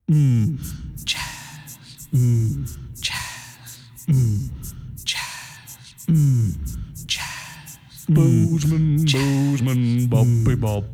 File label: Jazz; NOT FOR BROADCAST Original Soundtrack